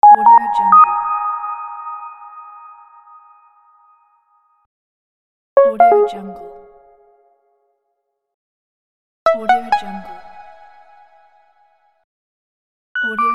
flat infographic sounds

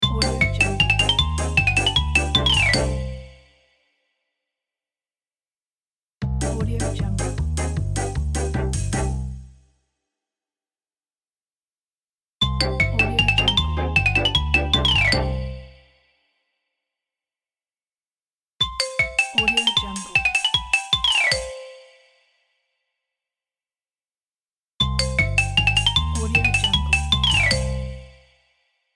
circus time logo

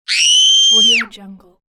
child short scream

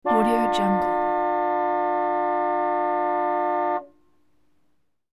cadillac long distant horn blast

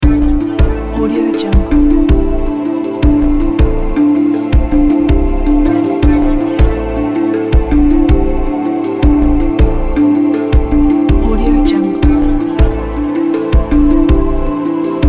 quiz game show thinking loop

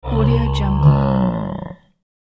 dinosaur brooding carnivore

